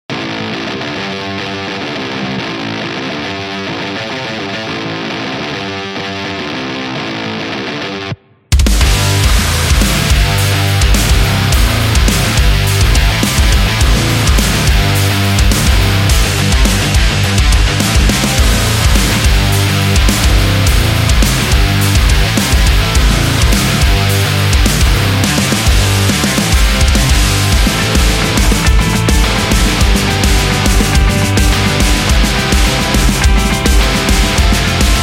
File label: Sport Rock Metal